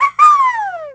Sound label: flutter jump; yoshi; smsunshine